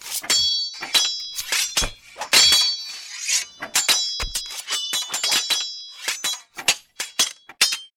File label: basic barracks fight